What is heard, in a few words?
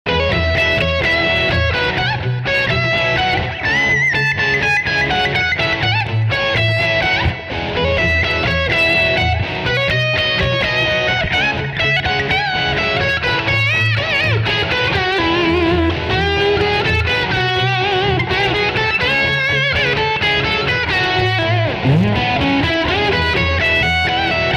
Line6 Mobile In Brit Hi Gain; Rock N Roll Relics Thunders